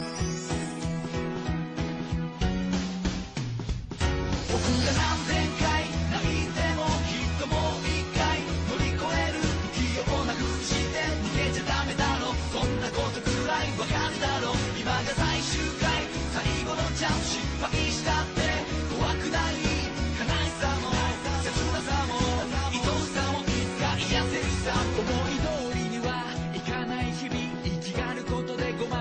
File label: Skip Beat